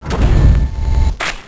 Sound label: turr idle; weapons; fortress